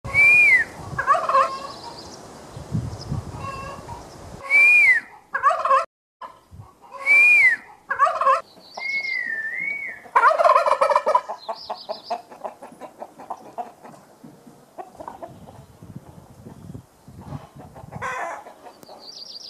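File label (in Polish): odgłosy zwierząt indyk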